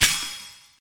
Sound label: Ice Shard Break; Elora